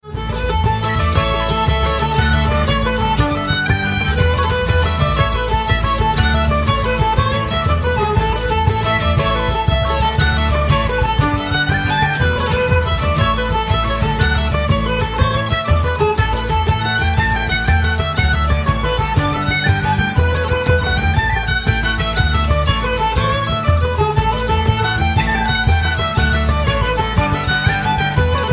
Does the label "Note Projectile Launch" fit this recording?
No